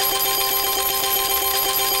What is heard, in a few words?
collect; hotfruits27